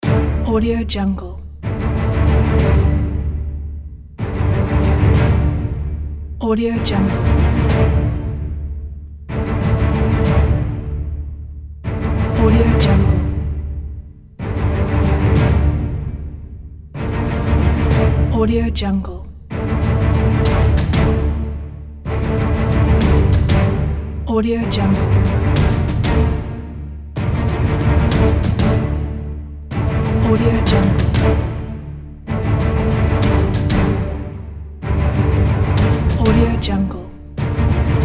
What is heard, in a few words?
sports promo